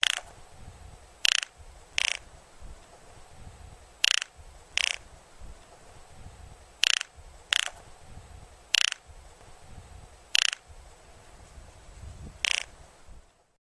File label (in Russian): kukushka-podorozhnik